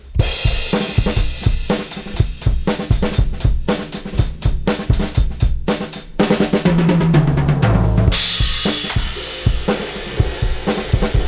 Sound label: drums; Parallel mojo